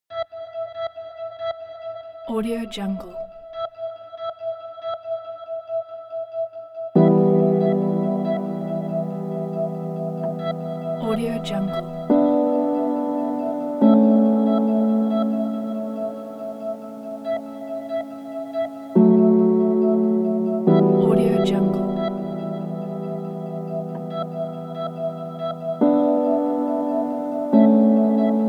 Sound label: sad piano